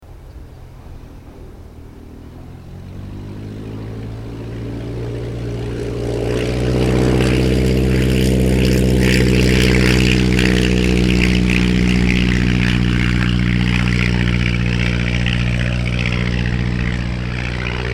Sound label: aircraft ryan stm-s2 1940s touch n go stereo